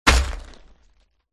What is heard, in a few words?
dragon red attack contact bottom